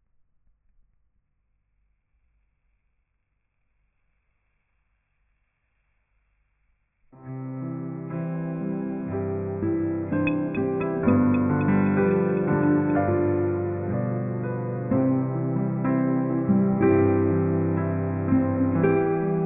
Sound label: Relaxation music